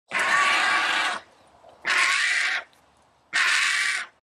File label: Indian vulture